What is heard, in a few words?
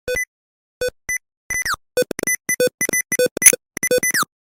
sticky keys spam